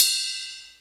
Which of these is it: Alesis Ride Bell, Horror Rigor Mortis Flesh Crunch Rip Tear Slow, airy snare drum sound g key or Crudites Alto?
Alesis Ride Bell